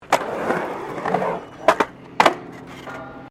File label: Skateboard Half Pipe Through; Skateboard sounds; Skateboarding; Sports; Skateboard Half Pipe Through 01